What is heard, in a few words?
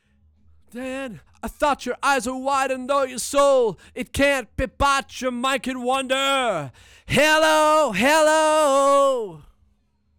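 vocal; Pro Tools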